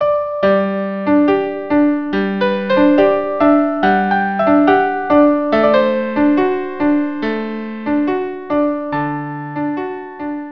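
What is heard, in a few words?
violin viola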